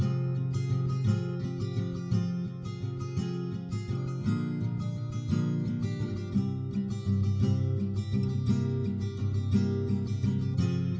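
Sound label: no drums, live recording, 250 ms, source; SiSEC11; underdetermined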